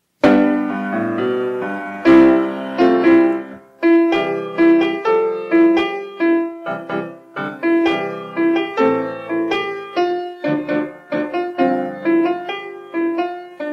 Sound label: Exercise Piano Only